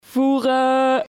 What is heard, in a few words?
voooeeerreeeuhhh